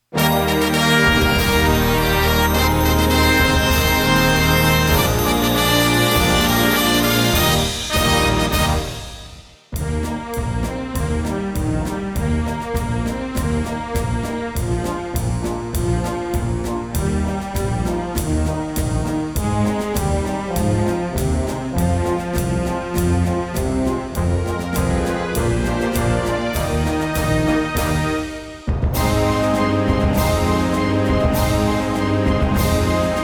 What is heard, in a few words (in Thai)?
เพลงประจำคณะพานิชยนาวีนานาชาติ backing track